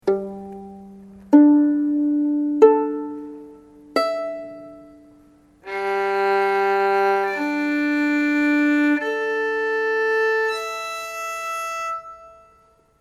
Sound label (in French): violon cordes pizz arco; Duperrex